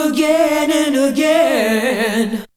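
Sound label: voice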